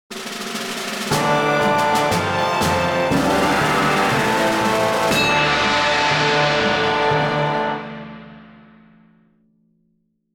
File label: classic victory; guns of icarus